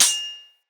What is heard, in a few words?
Melee Sword Impact Generic